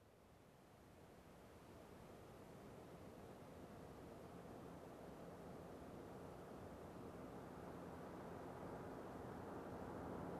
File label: SUV passing by with medium speed